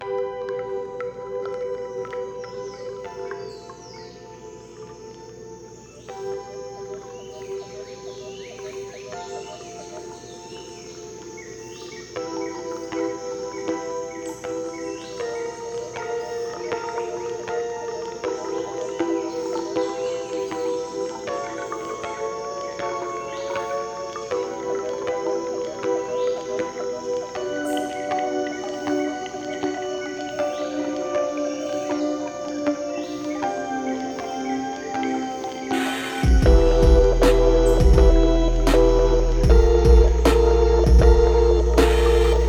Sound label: Lo-Fi Remix; nintendo halloween megamix 2023; Viridian Forest; (RBY)